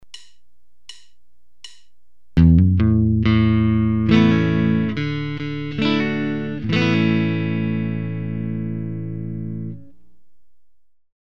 Strumming a chord while hammering one of the note, guitar